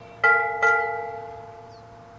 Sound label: Bell Buoy Ring